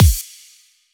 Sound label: dubstep club kick